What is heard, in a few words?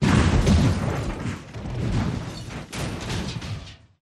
Car Crash Roll